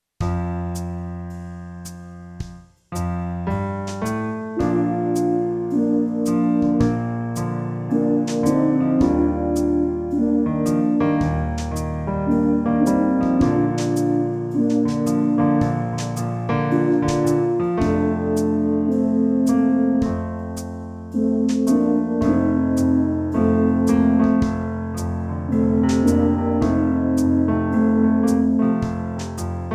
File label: 2 semi in Bb, brass